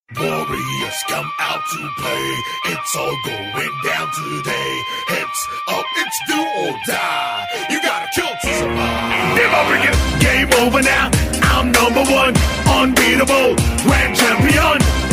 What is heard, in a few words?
Tamil Rap